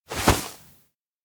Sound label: grab arm; chiharu; anemoi パッケージ版 1.1